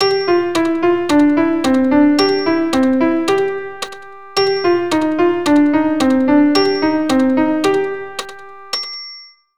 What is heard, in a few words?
P4 RH mirror, keyboard